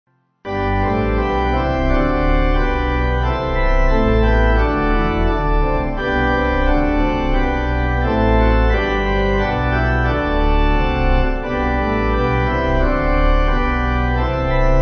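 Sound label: Pipe, Pipe Organ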